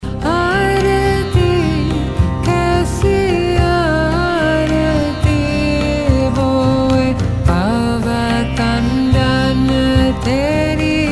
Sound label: Sikh Ringtone